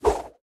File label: Throw Generic